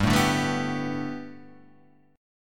B Minor 7th Sharp 5th G, chord

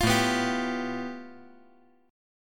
A 7th Suspended 2nd Sharp 5th; 10,9,7,10

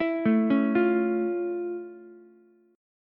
F Augmented Major 7th F Ukulele; F+M7; arpeggiated

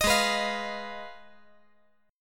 G sharp Minor 6th G sharp down; G#m6 strummed; JGuitar

